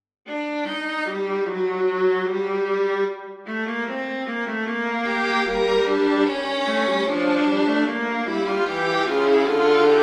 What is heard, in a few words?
fugue minor for string quartet; bach johann sebastian